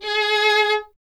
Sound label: STR VLN, Vln Detache; Miroslav Vitous